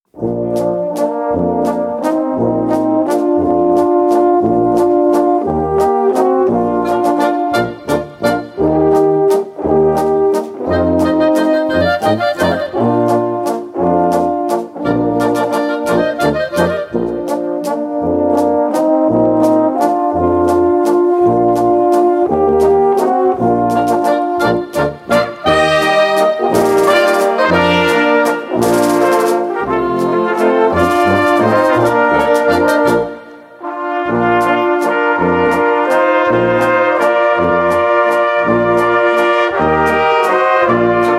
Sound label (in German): maerchenwalzer